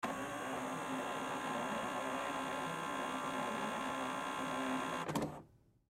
Printer Copy Machine Turn On; Printer Hewlett Packard Copy Machine Turn On; Office; Blastwave

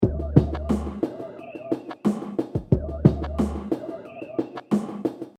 Hip Hop Drum Loop 90 Bpm Straight Lo-Fi; Everybody Now

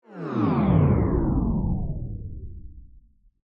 Whoosh Descend; Whoosh, Debris, Swirl, Production, Element, Imaging, Accent, Transition; BlastWave

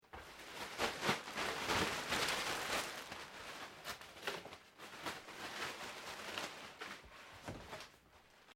PLASTIC SHEET MOVEMENT; Material components for constructing more complex sounds

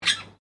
Bird Parrot Indian Ringneck Chirp

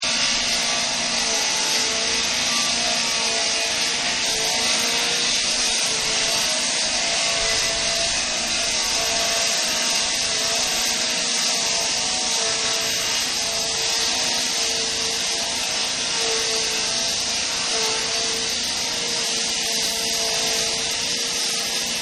Concrete Cutter; Saws; Machines; Partners In Rhyme

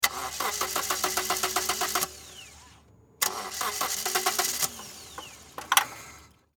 Car Low Battery; Car , Attempt To Start , Low Battery - Sputters; Cars; Vehicles

